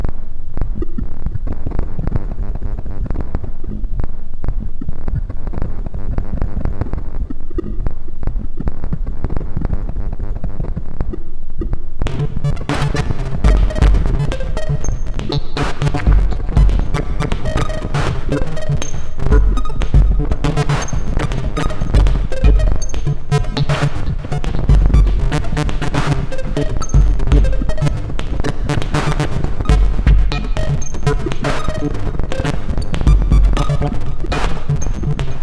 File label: drone beats